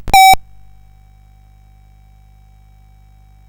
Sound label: sid CSG8580R5; Frogger II-Three Deep